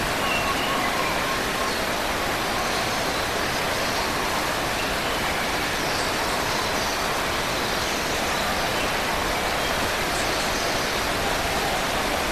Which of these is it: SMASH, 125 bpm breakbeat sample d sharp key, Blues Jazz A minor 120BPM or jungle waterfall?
jungle waterfall